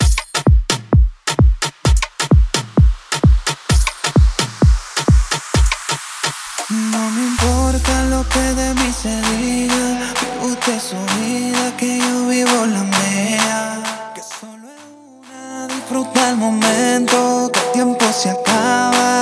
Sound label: CLUB AFRO BEAT